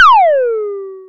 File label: SPACE DRUM; Rubber Chicken Software Sample Bank; DRUMS + PERC